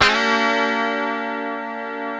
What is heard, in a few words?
G#3 D funk chord, guitars; Spectrasonics; Hans Zimmer Guitars VOL-1; 90's Sample CDs